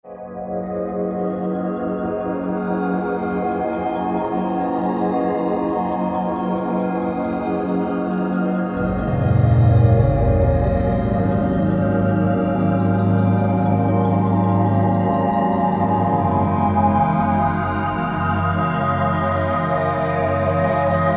Dreamesque, House Collection; Roland SR-JV80-19 House Collection

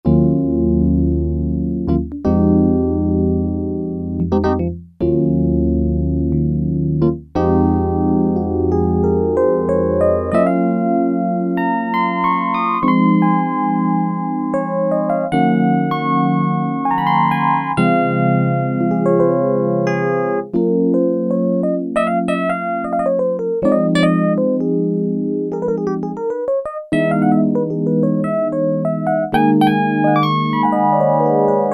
E.PIANO, Yamaha DX7

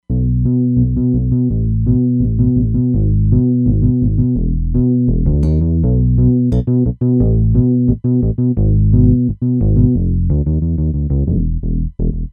Fundamental, Roland Fantom XR

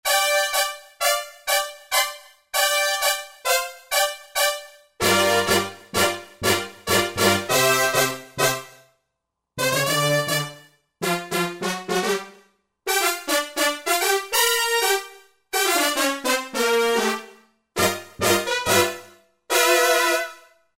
Brass, Roland JD-990; Factory Patches

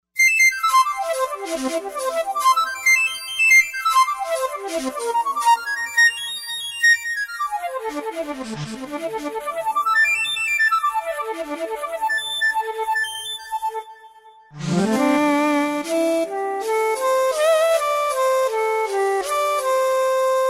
Shakuhachi, Korg TR-Rack

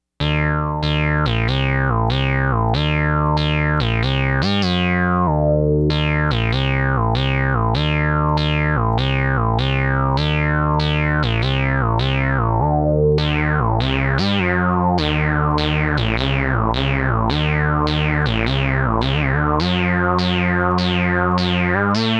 THE MOOG FAT SOUND, Moog Micromoog

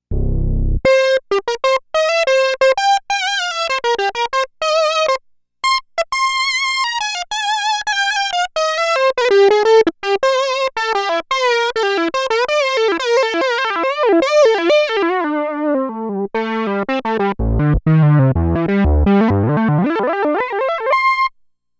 Solo Unison

